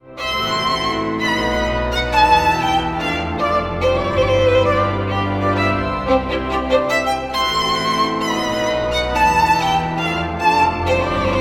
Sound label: Pop violin; Mendessohn Wedding March